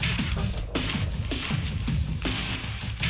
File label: Classic DrumLoops; Best Service; XXL 1500 Classic DrumLoops